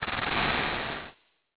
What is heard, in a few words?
snake